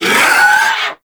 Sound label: Swarm Vocal, Enemies